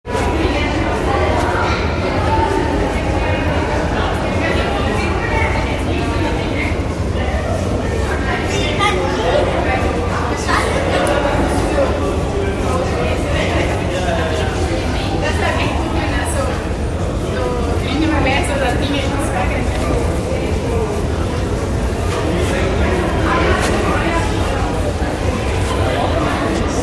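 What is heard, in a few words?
tunnel sound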